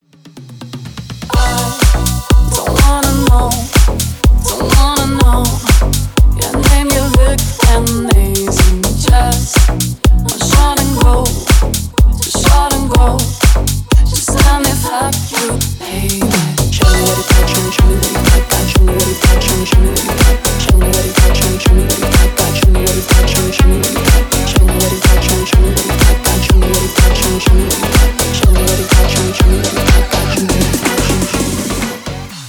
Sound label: groove remix